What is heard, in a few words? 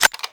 button click